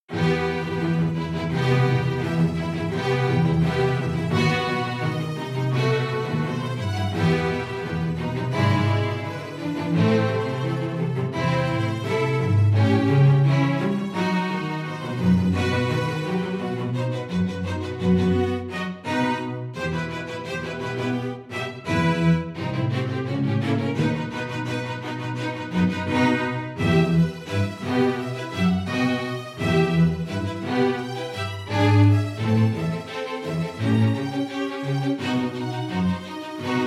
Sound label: Strings; Solonoid Studio; Soundiron Hyperion Strings Elements